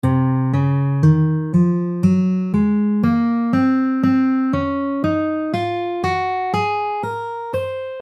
D Major Locrian Modes, guitar

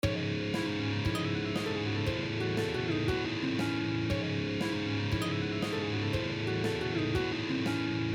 String Skipping

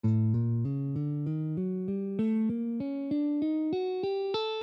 A Oriental

